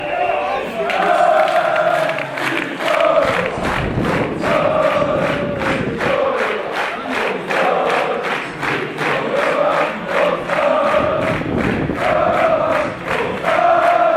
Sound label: fanchants; brno pisen